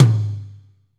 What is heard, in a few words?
TOM, Ballad; Northstar - Drumscapes Roland